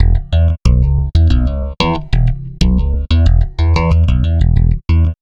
Stick Loop, Stick Bass

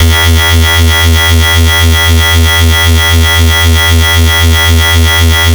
FS (E2), FightSynth; neuro; Stanza; FightSynth Multi

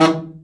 BRA Bari, SAX; Best Service Gigapack 1; BR SAX 2

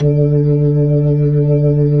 B-3, Jazz

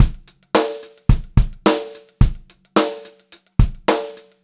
Pop-Rock Drumloops 108 POP BEAT; USB Soundscan vol.37; AKAI

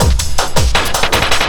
Drum & Bass, 160 BPM

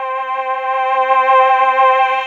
MISTY CHOIR, OLD SYNTHS; Rubber Chicken Software Sample Bank

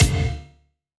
Snare, Gated Reverb; creative gate; musicradar

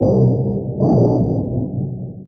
Industrial Bass, Drum N Bass; Sonic Foundry (Sony Creative Software); Knutrix Drum & Bass

